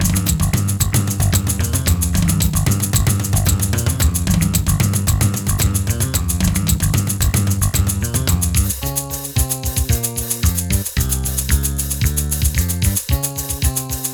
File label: Guitar Ghoul; Scooby-Doo Unmasked